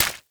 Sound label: body hit bloody